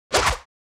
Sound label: Imp atk; FlyForInsanity